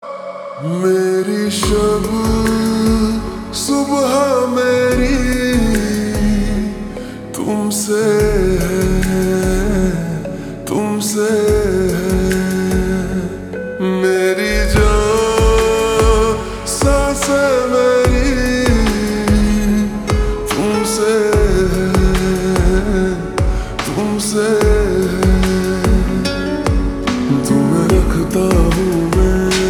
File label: Ringtone Slowed Reverb